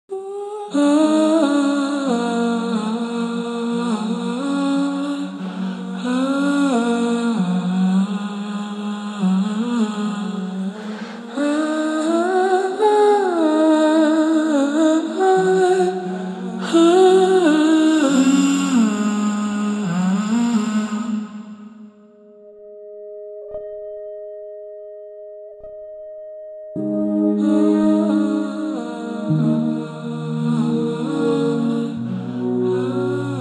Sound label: weired Vox